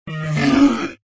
zombie pain

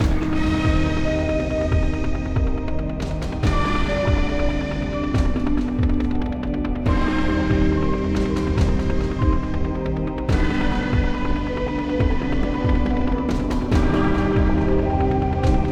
Marauder Turn

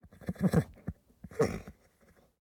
horse pair vehicle idle

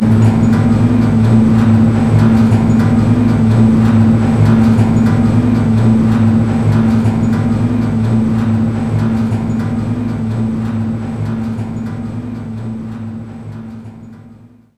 Elevator Loading

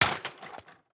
plastic break; teardown ps5 windows xbox series xs gamerip 2022

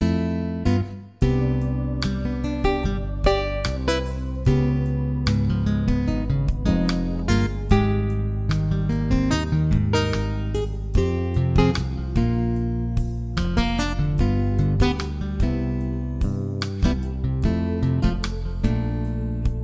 BGM; Adventure Player; psp

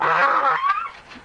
Goat Man Panic

